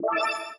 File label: win